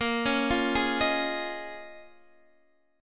A sharp Minor 11th A sharp down Guitar Open D, chord